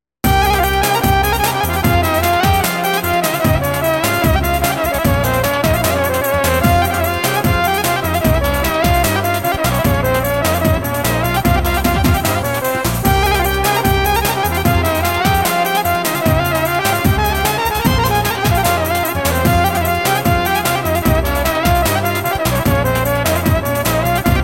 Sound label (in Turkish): Ada Ciftetelli